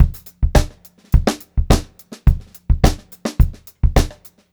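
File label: 106 groovy variation 2, funk groove drumloops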